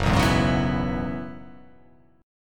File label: Gb 7th Suspended 2nd Suspended 4th Gb down; strummed; JGuitar